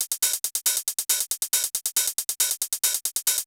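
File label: open + closed hi-hat, techno 138 bpm; Best Service ProSamples vol.54; AKAI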